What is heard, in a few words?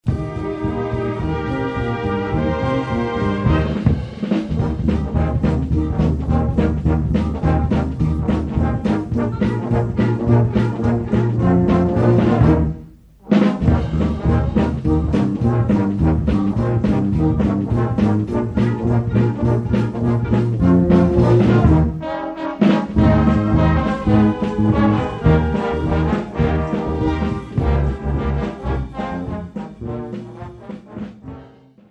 tromb